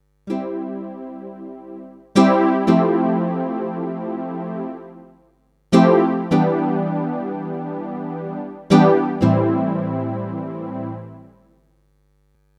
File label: Pads